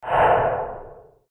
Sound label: Short Swoosh